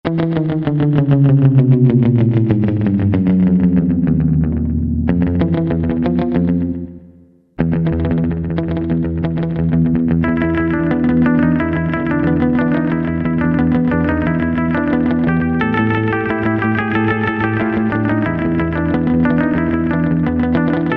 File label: SURF GUITAR, Roland SC-880; Factory Patches